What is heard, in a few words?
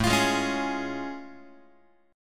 A Augmented Major 7th A Guitar; strummed; x,5,8,6,5,7; JGuitar